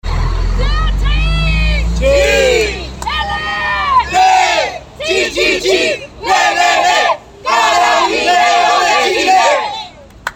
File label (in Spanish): ruido ambiente